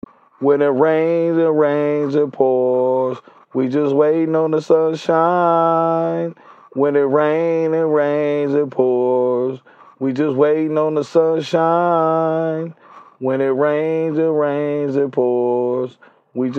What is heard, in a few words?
hip hop 163 C sharp, Acapellas